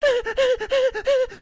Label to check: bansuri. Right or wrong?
Wrong